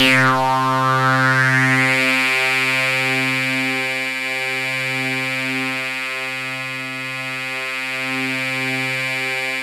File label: THE BUZZ, KURZWEIL K2000